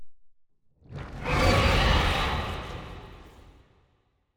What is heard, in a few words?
aquatics dragon attack mouth